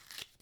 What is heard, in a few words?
move deck; sfx; AfterStory; Doki Doki Literature Club